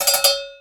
flag hit; earth-to-echo; downhill

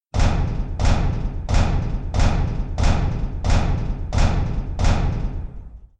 footsteps; chambersOfDoom

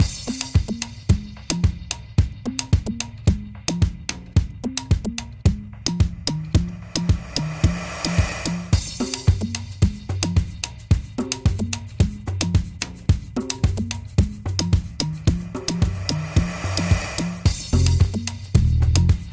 music; cheesy african; hippo Basketball

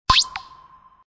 falling

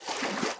paddle; cheesy-pirate; sailingWithSharks

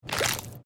splat

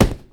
dart, nerf; nerf-ultimate-party

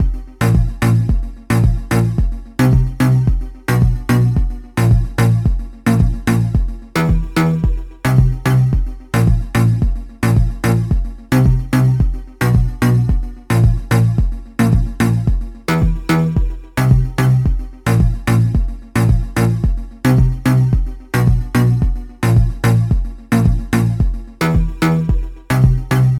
music; cheesy world of adventure; parachute

